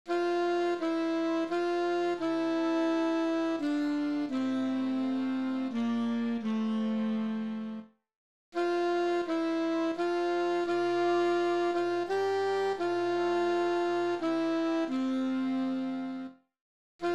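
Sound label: alto sax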